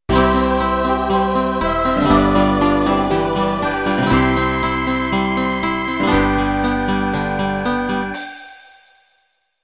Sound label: Schlager Intro